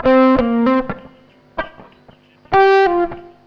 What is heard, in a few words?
CLEAN, CLEAN GTR